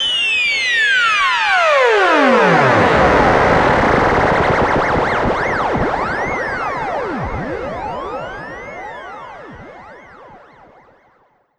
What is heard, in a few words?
SFX, Trance NRG